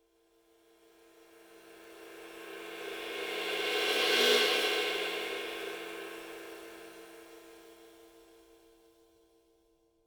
Flash 24 Ride Rivets, Cymbal; Coll Anderson

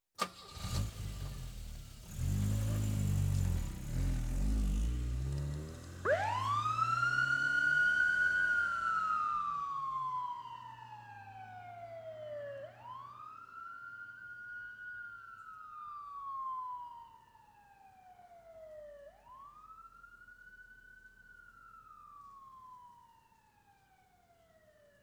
Police Car Departs With Wail Sire; Emergency; BBC Sound Effects Library

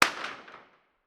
Wooden Plank Snap; 99Sounds; The Warehouse

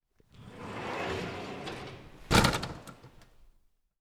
Shutter Door; 99Sounds; The Warehouse

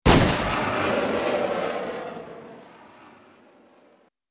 Bullet Time; FXHome; Bullet and Gun

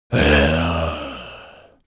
ZOMBIE Idle; Monsters; SmartSoundFX

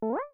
phase jump; Kenney's Sound Pack; Digital Audio

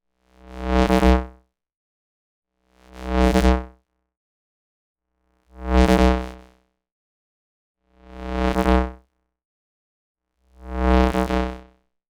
EMF DVD Player; Soundbox Library; Electromagnetic Fields Collection

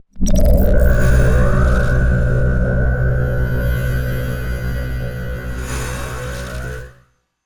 Texture; Sound Design Tools; Textures

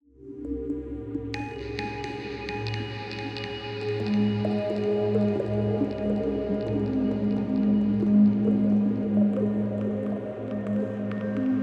Mystery Bells Drone, Cinematic Drones; Justsoundeffects

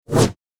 Double Bladed Saber Sound; FXHome

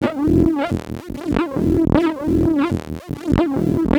Bass drone F, Circuitbend; Drones - Bass; 99Sounds; Mangling Audio

